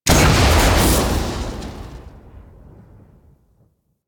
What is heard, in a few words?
Rocket Launcher Fire; Warframe; UT Weapons